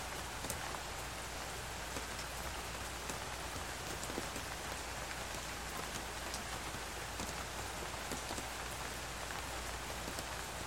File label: rain